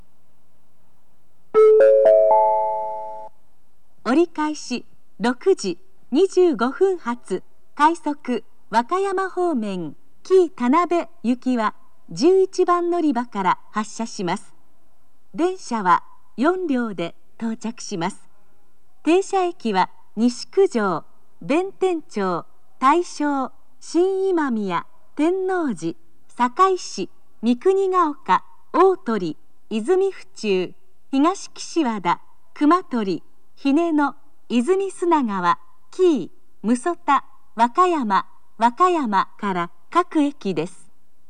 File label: shin osaka rapid kii tanabe